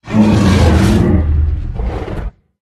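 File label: bear die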